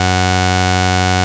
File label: Pro 1 SAW F#3, Vintage Keyboards; Big Fish Audio; First Call